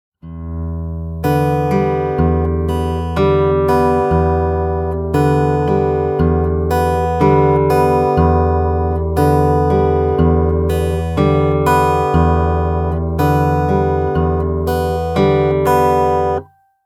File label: Fingerstyle, acoustic guitar; alternating bass fingerstyle pattern; Alternating Bass Fingerstyle 3